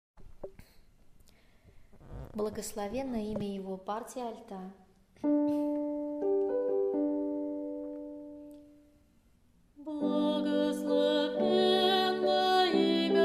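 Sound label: alto; Blagoslovenno Imja Ego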